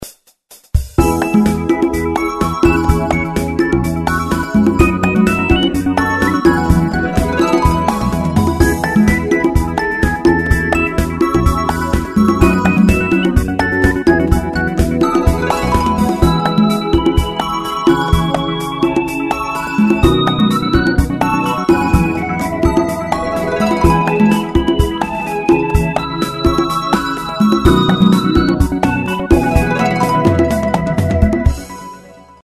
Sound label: Whistle; KTF; EV-W350